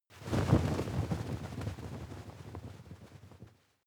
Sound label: fly movement flutter multiple; bewegung; comedy-cartoon; soundpool